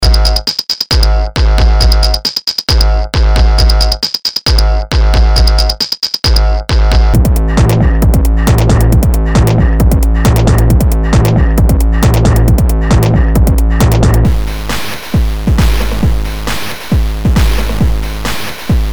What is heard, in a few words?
Industrial Drums